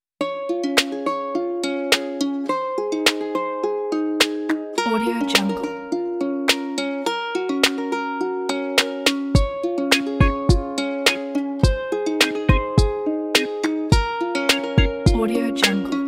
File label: gentle fun acoustic